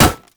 basic attacks; Dr. Mundo; TPA Mundo

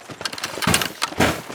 dance loop; LoL; twitch

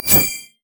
riposte; LoL; fiora

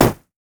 blue minion mech basic attack; LoL

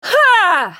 spell cast effort, en US; Sivir

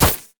bandage toss; LoL; badrobotamumu

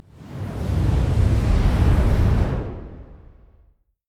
summonerspell base exhaust; LoL

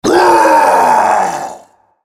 spell cast effort, en US; Rengar

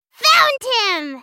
ultimate effort; Annie

